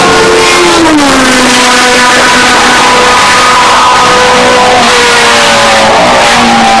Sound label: F1 Racing Car